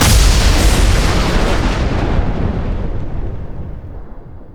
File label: thunder claws; LoL; volibear